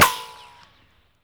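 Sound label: ricochet, weapons; Dystopia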